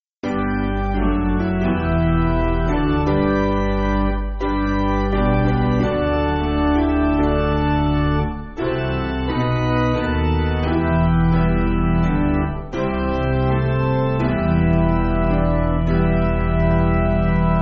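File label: Piano, church backing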